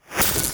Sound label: Glitch, noise